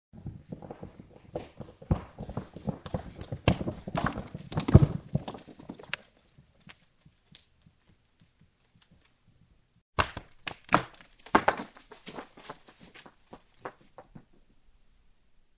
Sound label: stone rolls down